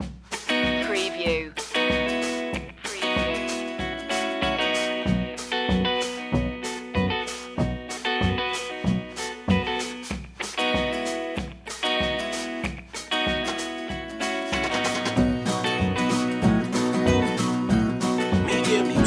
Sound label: rhinestone rambler loop